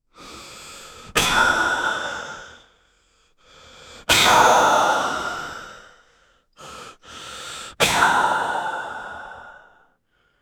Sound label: young man having struggle